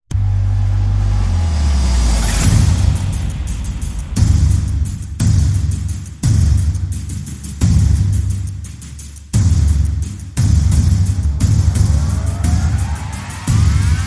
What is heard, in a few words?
Perc and FX Only